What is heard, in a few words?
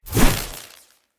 Lightning Grenade Throw; Weapons; Enemy